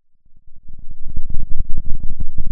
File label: Sound of a thunderstorm, center